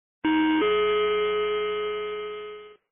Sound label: coin